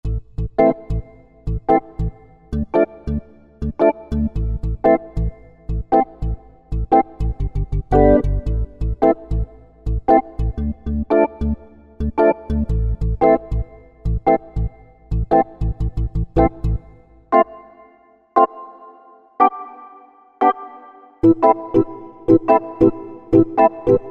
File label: Rock Steady, Korg Wavestation A-D